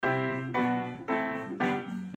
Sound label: Piano